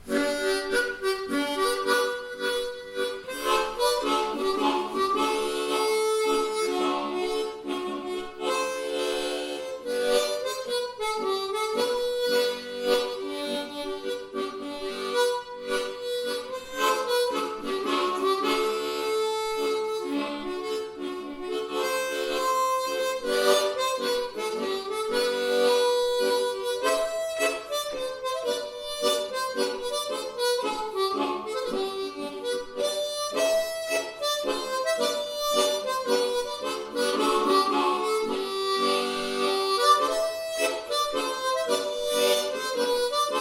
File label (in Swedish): Polska, munspel; efter Båtsman Däck